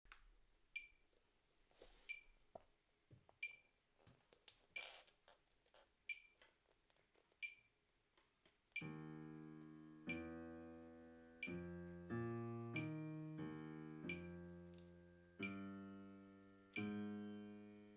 cello

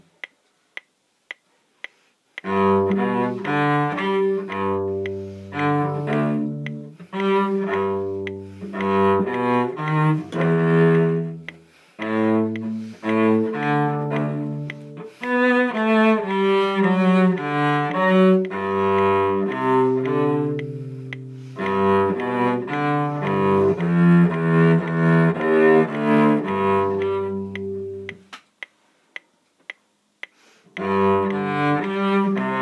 Minuet in G cello

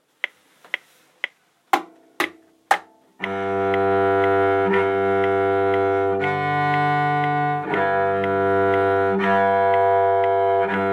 Waltz cello guide track; 1st cello; Yellow Ensemble Half-minute Waltz

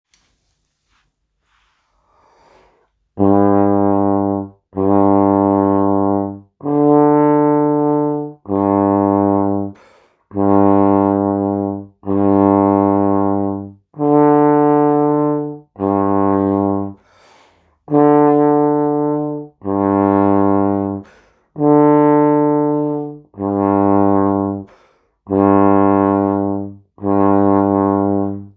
Trombone Half Minute Waltz guide track

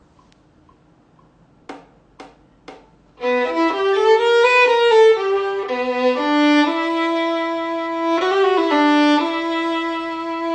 Violin Part

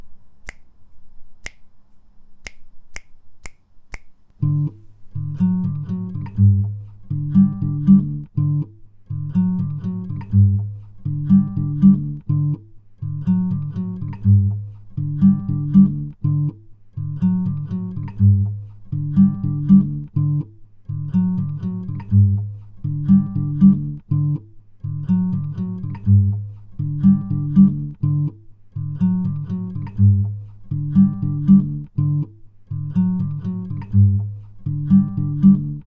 bass; Ode To Joy

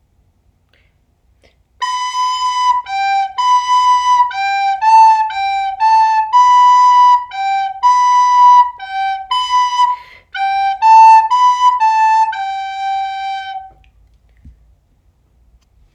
Recorder Descant Half minute Waltz

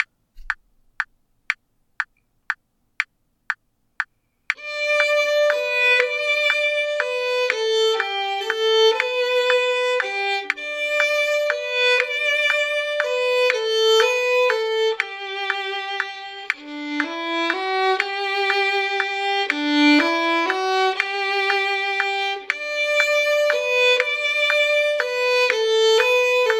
Violin Half Minute Waltz with click120

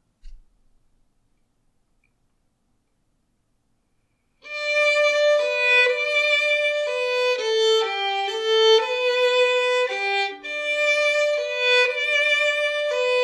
Violin Half Minute Waltz no click

